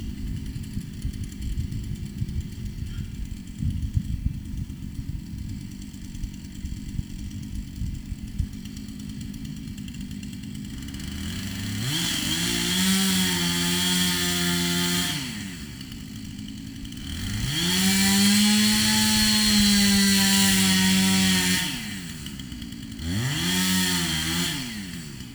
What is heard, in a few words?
Chainsaw loud